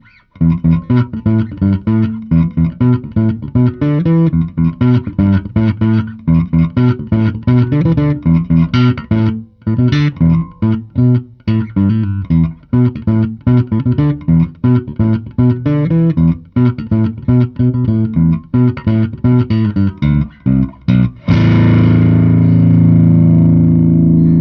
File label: hiwatt, bass